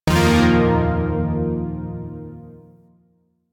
Shawzin Blues Chord; Zariman Shawzin

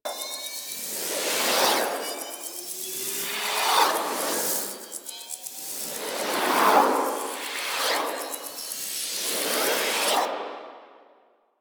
Anchor Rewind Loop; Warframe; Protea

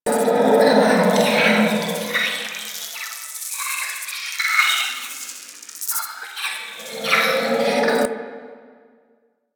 Vent Pit Breathing Loop; Props; Warframe; Ambience; Sentient Devourer

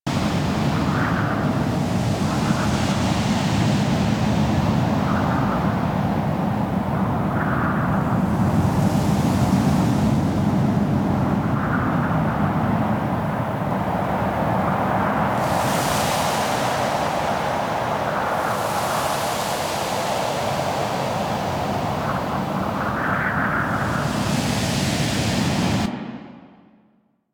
Exterior Foreground Desert Loop; Ambience; Duviri; Warframe

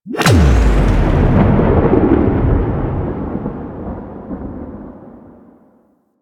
Portal Open; Entrati Labs

